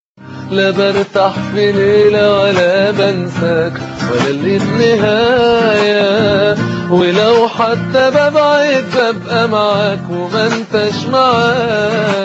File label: guitar; egypt